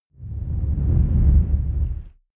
whoosh dark storm soft

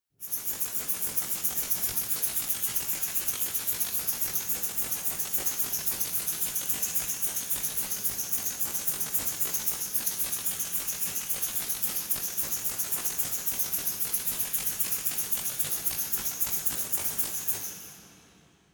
resonator rattle slow hard

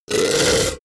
beast choke short

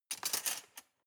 cutlery large multiple drawer place soft

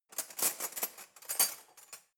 cutlery knife drawer rattle

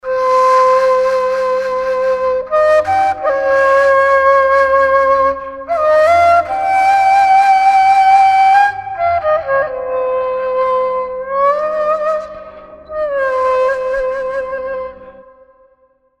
oriental flute

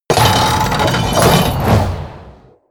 catapult siege trebuchet medium shot

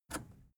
plastic button scratch push disable

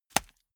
small punch fleshy

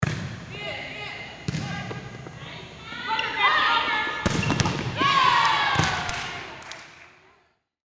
volleyball practice

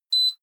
oven on

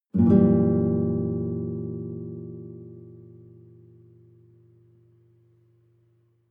nylon guitar chord full diminished soft

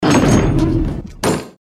servo door close

